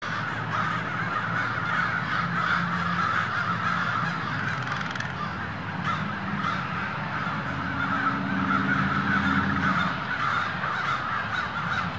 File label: crows